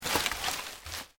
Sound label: LEAVES DEAD MOVE, Rustling; Material components for constructing more complex sounds; Rustling (Sound Effects:Objects)